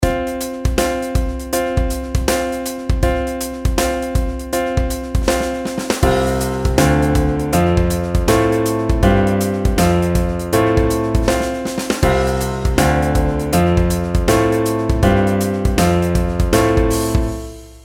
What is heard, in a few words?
pitch patterns skipwise; melody